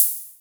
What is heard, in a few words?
Hi Hats Open; LIMAT Div Dept